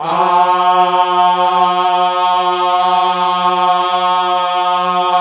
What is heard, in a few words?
GOSP. AAAHH; Sound & Vision - Gigapack II CD 1